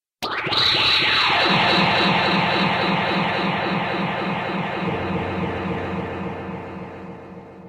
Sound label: Ultra Transformation